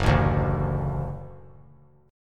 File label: F sharp Minor Sharp 5th F sharp; strummed; JGuitar